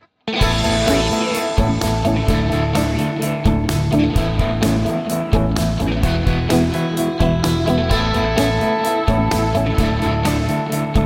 sidewalk rock

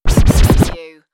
Turntable Scratching

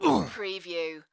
Pain Umph, Male Painful Grunt, Hurt

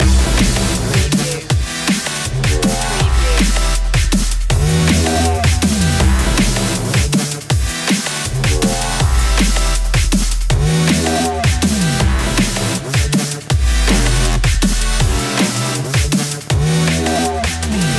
moving up loop

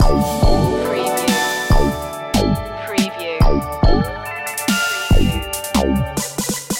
alien pets loop

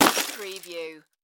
Rock Smash Hammer; sound effects bible

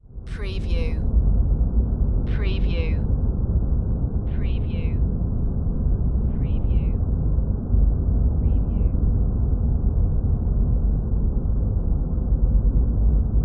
Planet Atmosphere

